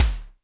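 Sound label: kick Lo Wisp, Alesis D4; 300 Drum Machines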